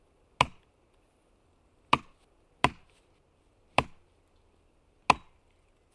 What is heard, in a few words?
woodcut, chop